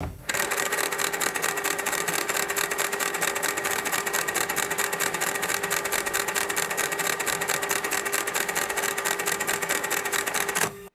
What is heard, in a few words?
vintage computer disc drive clacking and buzzing; ui